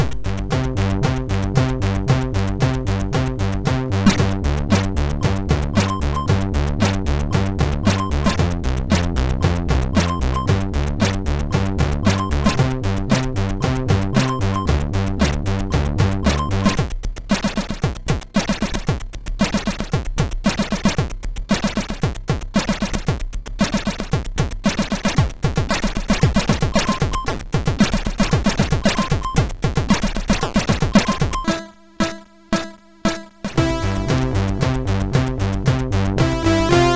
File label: Spectrum tune MOS6581R2